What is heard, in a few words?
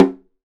Snare Drum; Percussion Samples